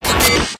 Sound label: medi shield burn, weapons; tf